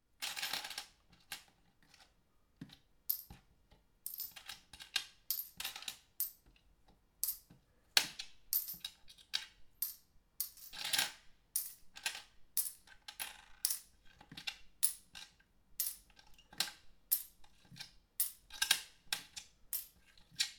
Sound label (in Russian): schet monet